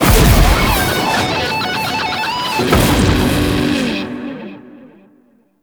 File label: Solo Cast; Warframe; Temple